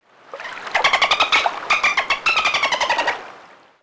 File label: Bird; 特酷声音素材库